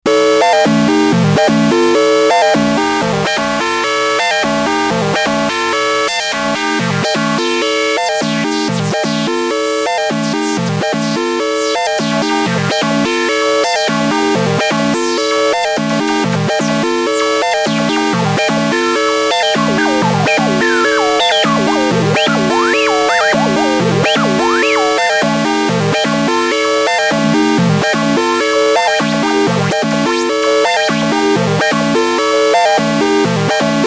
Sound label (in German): Behringer Model D High Pass Filter von Hand Modeliert